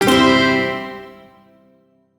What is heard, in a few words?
Shawzin Pent Maj Chord G; Warframe; Duviri